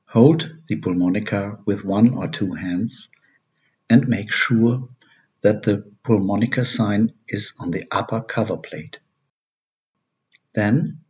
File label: pulmonica exercise